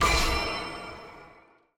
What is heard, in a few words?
UI Event Style Rank C; bloom minigame